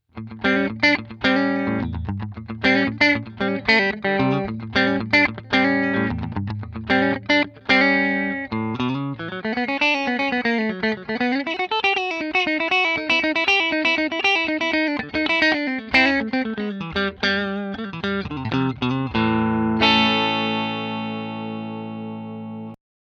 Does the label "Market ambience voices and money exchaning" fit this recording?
No